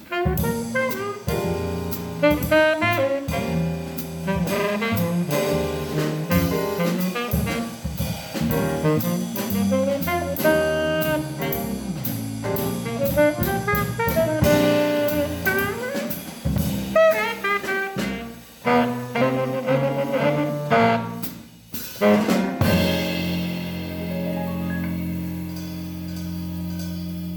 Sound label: Saxophonist; Tenorsax